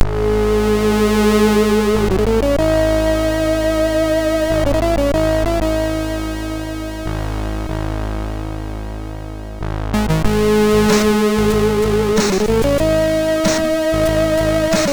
sid MOS6581; Dragon Remake; MOS6581R3

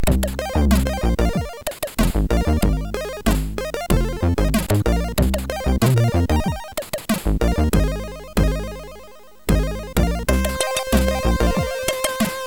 Strange Solo sid MOS6581R4; Longhair

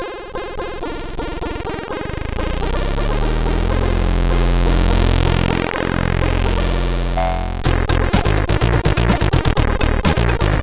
CSG8580; Grandgong

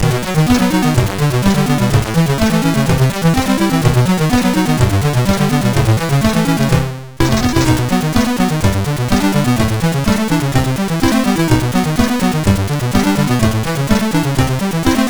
sid MOS6581R4; Dynablaster

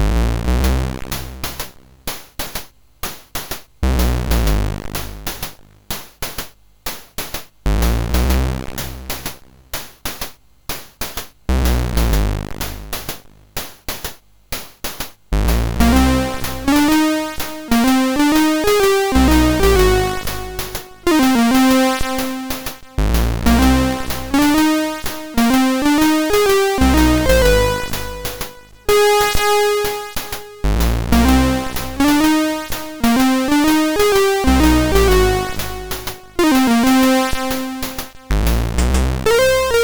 Theme, MOS6581R3

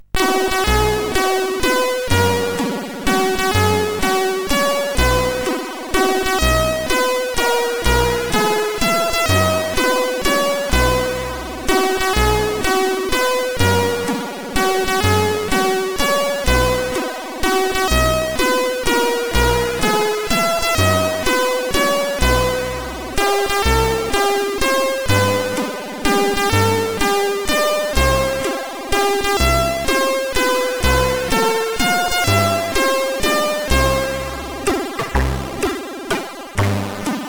Happy Birthday, sid CSG8580R5

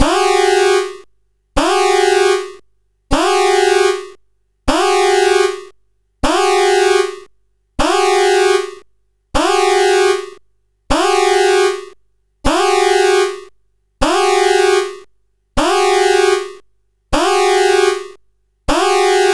Metal Warrior 2, CSG8580R5

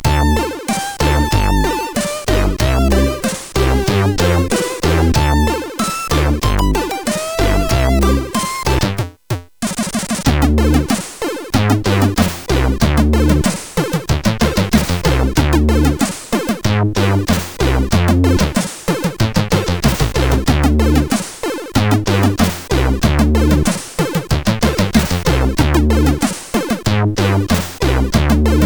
bass, CSG8580R5

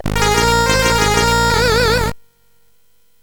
Boing, CSG8580R5; hvsc

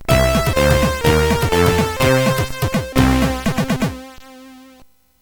sid CSG8580R5, GAMES; Break Down

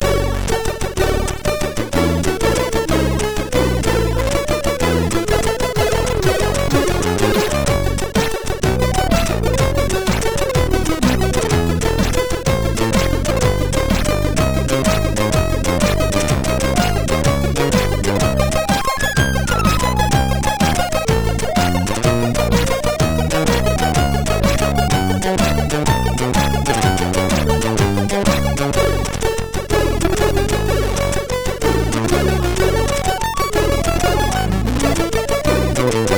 Elves Forest, MOS6581R4

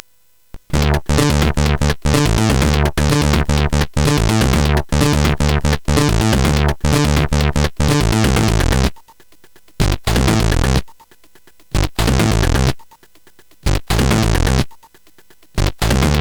Funk 2, CSG8580R5

